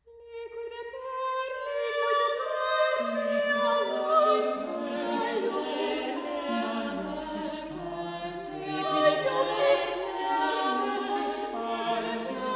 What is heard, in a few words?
Madrigals for 5 voices; Book 1: Liquide perle Amor; Luca Marenzio; La Compagnia del Madrigale; Primo Libro di Madrigali a cinque voci, 1580